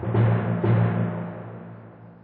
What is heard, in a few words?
Tymp